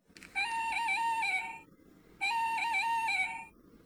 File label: weird parrot